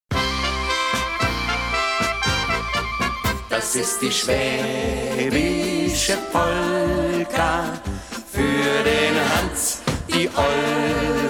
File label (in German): Schwabenland Polka